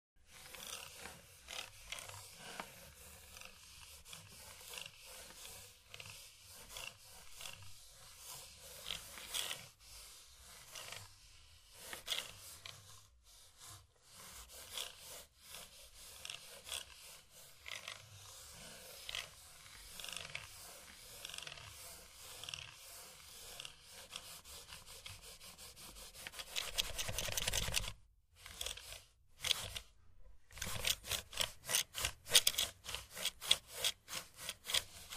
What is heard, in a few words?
Fast Mouse Movements, mouse